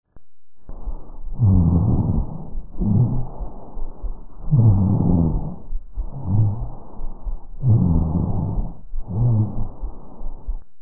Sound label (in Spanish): roncus adulto